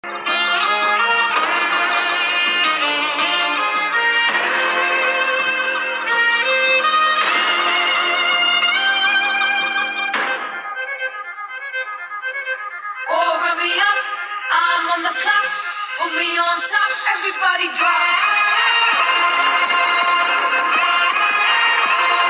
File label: theme music, mass bgm; kalki